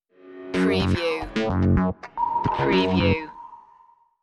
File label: 80s cop funk stinger